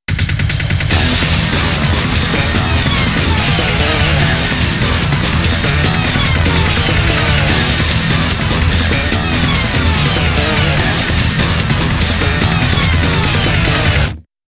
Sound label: Jingle Awake Hero; shadow the hedgehog original soundtrax